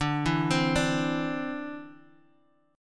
D 7th Suspended 2nd, D, down, Guitar Half Step Down